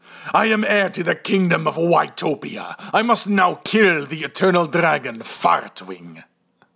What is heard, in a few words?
fart